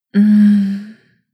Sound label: mmm, message tones